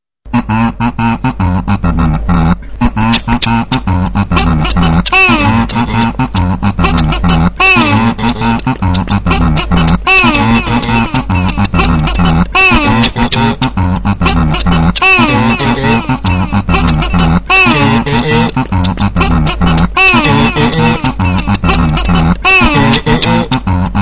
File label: kazoo